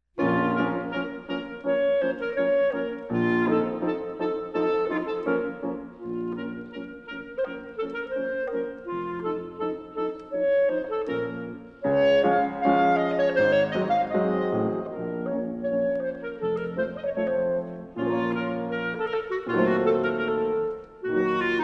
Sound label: Hebraic Music for Clarinet